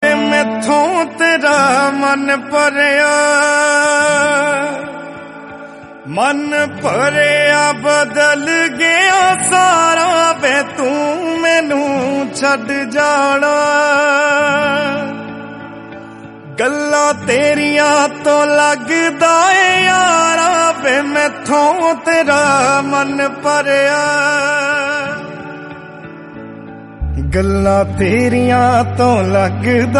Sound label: punjabi song